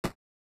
Shoot

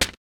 Toaster; rabbids multiverse; iOS, macOS, Mobile

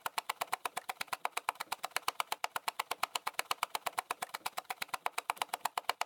Wheel Loop

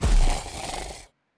Enemy Woodsman Walk

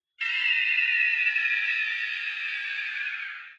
eagle boss Cry

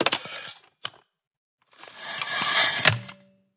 Skip Button

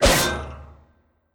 Dracosoldier Shield

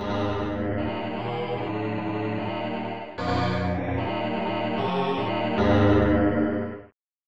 Fanfares; Dragons Breath; Amiga

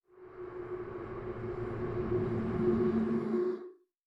Sacrifice chest reverse

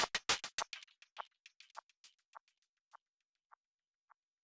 music endloop percus; music ftue endloop percus 02

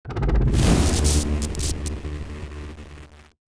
Electrical Surge Start; Starbites

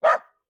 Dog Shiba

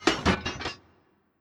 Tank Metal

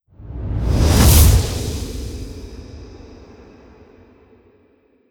Blow; Gargoylenight

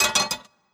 Grenadier Metal

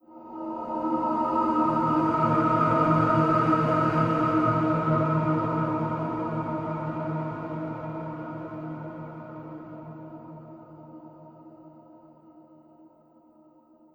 Intro Swell

